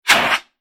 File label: Emergency Fire Brigade Fire Extinguisher Foam Single Blast Squirt Flame Burn; Machinery Sound Effects